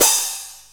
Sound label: PIATTI HI, ORCHESTRA